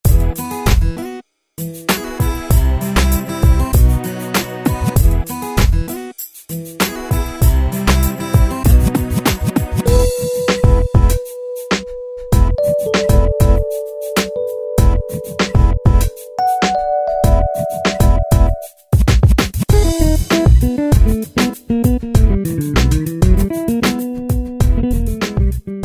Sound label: New R&B, Dance; Yamaha PSR-3000; Factory Styles